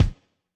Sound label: kick; Pop; pasific